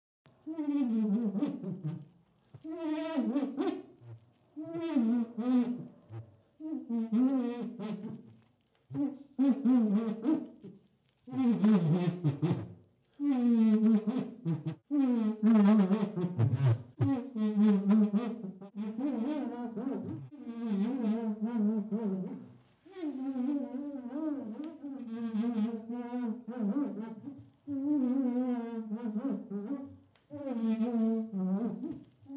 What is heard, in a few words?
Dragging finger on glass